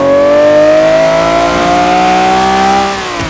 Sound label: second, vehicles